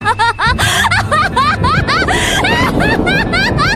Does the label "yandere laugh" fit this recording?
Yes